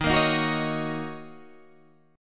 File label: Fsharp 6th, guitar chord